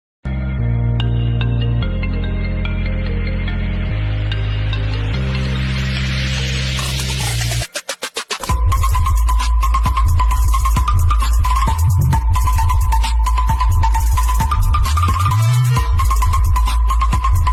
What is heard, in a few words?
jai bhim ring tone; iphone x